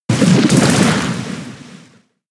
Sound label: Mud-Slap; pokemon sfx gen 7 attack moves sumo usum